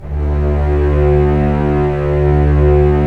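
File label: STR String Section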